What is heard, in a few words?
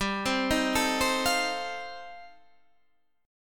F 7th G, F7/G chord; F7/G arpeggiated